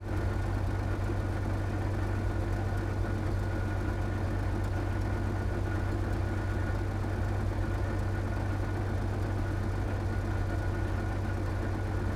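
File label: M5 High Speed Tractor onboard idle fast drive stop idle engine; Pole Position; PZM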